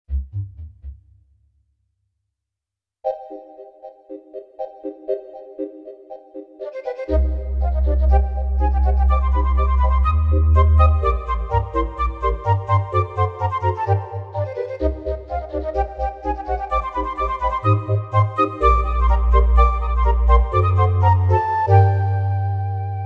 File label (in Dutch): Kerkorgel, roland v accordion church organs